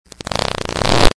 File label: Burble Fart; Voice Cloak Plus 1.0.43.0; Acousticons